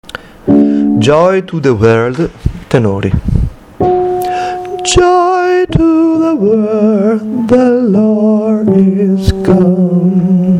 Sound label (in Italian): Joy to the world tenori